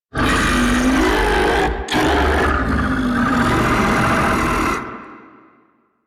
Octopede Idle; Warframe

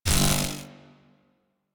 Beam Hit Notification; Rail Jack Enemy Ship

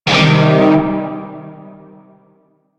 Shawzin Pent Min Chord; Warframe; Narmer Shawzin

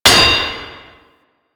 Worker Hammer Impact Metal, weapons

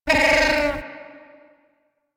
Bird Three Chuff; Warframe; Ambience; Entrati

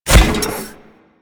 Step Default

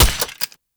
Shot Initial Mech; Warframe; Weapons; Acceltra Prime

